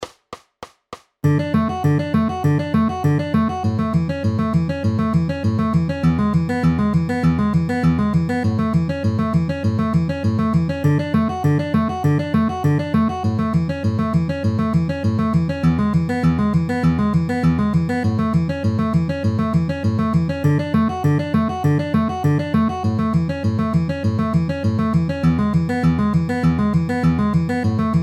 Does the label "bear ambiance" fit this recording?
No